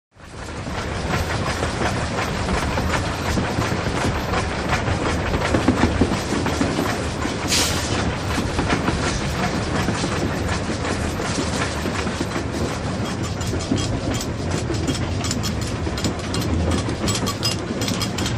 Train Constant (Exterior) Steam Engine As Heard From; Hollywood Edge 3-D SFx